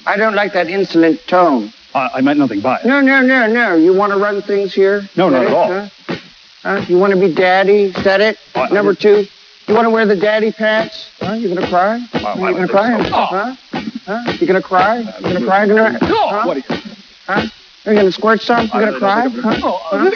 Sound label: cry; austin powers